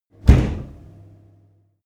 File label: fridge close